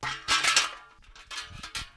kýbl; Mafie 1

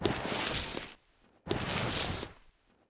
tazeni; Mafie 1

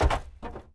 nasedání; Mafie 1

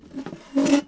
posunuti zidle; Mafie 1

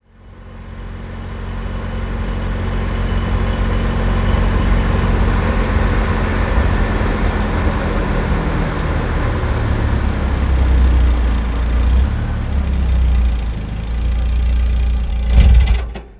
auto dojezd; Mafie 1

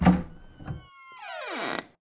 dvere otevreni; Mafie 1

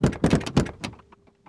auto dvere; Mafie 1

